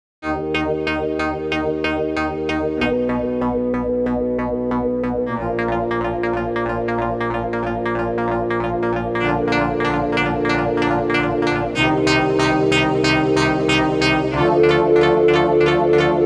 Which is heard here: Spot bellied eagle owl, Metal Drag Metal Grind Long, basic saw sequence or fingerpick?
basic saw sequence